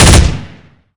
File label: 3 round, sound; svencoop; hl2